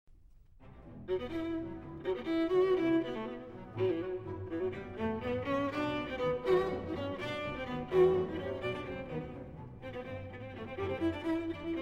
Viola Concerto Vivo, con molto preciso; 1962 Version

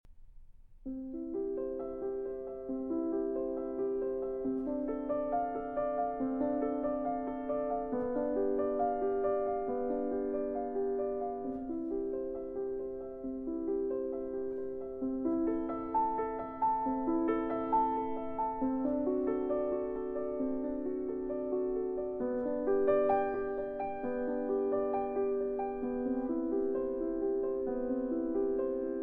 Prelude in C Major; BWV 846

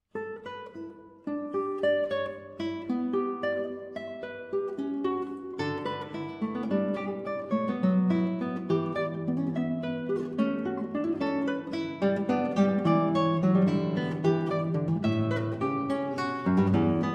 Sonatina For Guitar, Un Poco Mosso Luminoso; Angelus

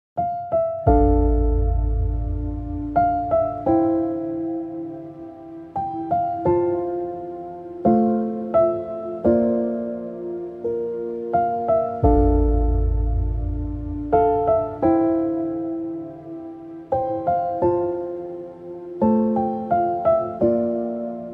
Piano

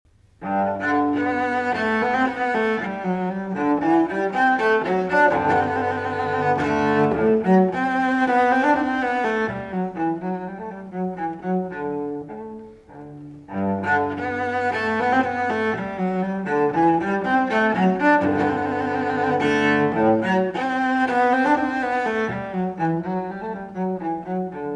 Cello Suite in G Major, Menuetto; Bach, JS; No. 1; BWV 1007 V. Menuetto I & II

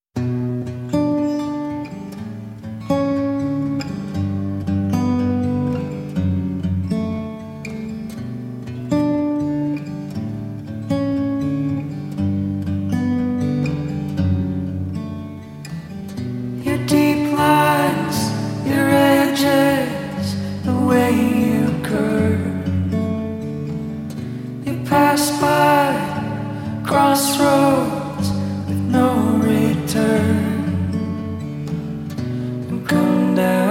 Acoustic

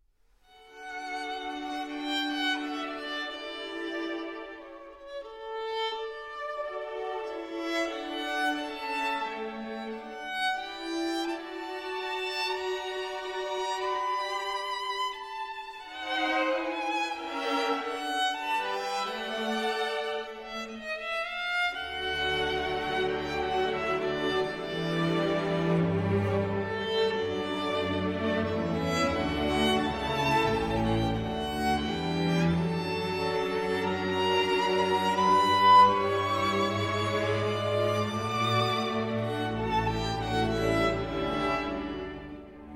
Romance For Violin and Strings in D Major

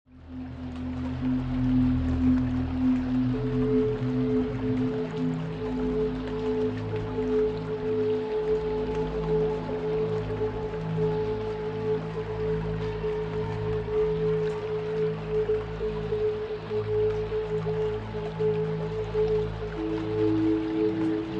Isochronic Tones 182 Hz

